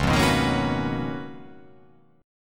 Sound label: Db 13th Db down, Db13 chord; strummed; JGuitar